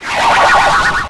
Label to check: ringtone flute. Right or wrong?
Wrong